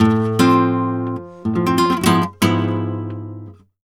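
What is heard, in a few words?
arpeggio guitar, Flamenco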